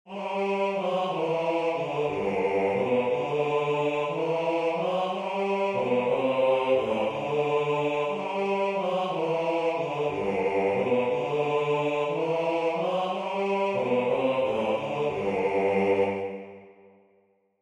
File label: Alleluia Taize Bas